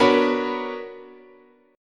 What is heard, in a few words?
B Minor Major 9th, guitar chord